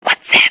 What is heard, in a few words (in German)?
whatsappp klingelton